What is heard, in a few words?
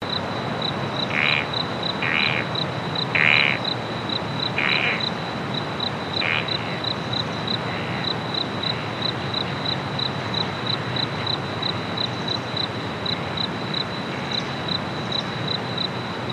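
Ambience Night Insects Mono Loop; the unfinished swan; Blue Zone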